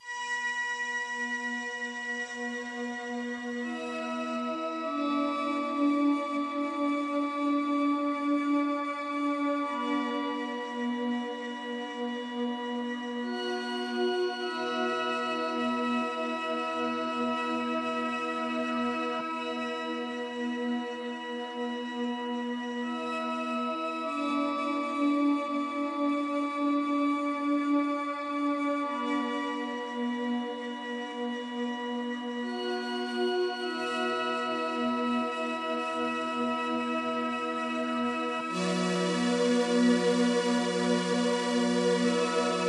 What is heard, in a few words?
ambient meditation AMBIENT